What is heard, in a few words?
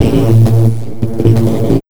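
synth, 135; USB Soundscan vol.25; Big Beat Drumloops; AKAI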